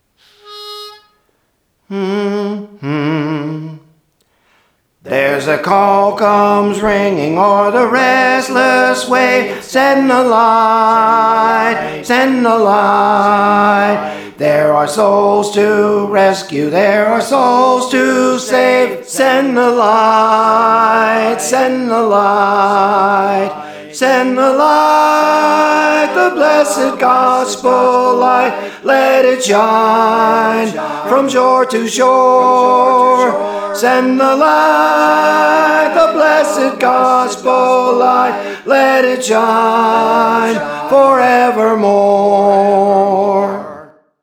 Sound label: Soprano, Praise For The Lord Training